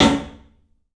club snare, 3D Drums; 3D Sound

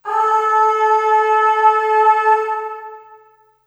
Aah Short A3 Short Reverb; Harmonic Building Blocks

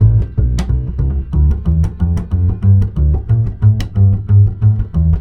BASS, Balkanish Traditions; USB Soundscan vol.63; AKAI

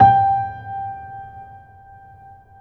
PED MF, GRAND PED

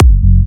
Jump Kicks, Bassdrums

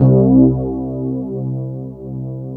WET ROAD C1 L, Keyboards Old School; USB Soundscan vol.09; AKAI

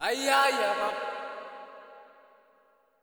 solo G# 4; Spectrasonics; Heart of Africa 2

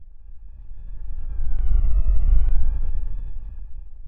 loop, techno atmos

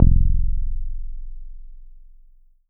BASS SH09 F0, Synths, Roland SH-09, Bass

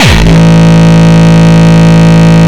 Longkick, kicks

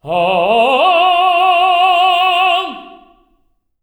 TENARP F#3, tenor arp, voices

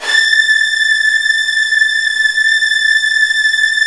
A4, Virtuoso Strings; ILIO; Kirk Hunter Virtuoso Strings VOL-1

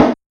Snare, Realistic Concertmate-670; One Shot Kit

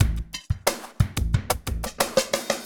90 slow, loops, hyper dance; Best Service

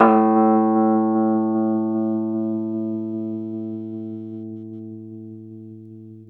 Rhodes 73 Slow

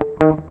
FUNKY, Grooves; USB Soundscan vol.31; Miro s Grooves; AKAI

